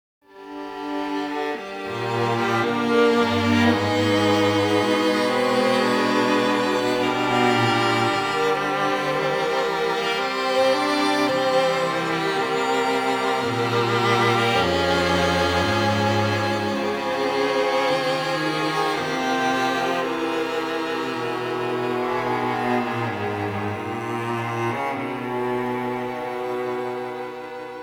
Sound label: quartet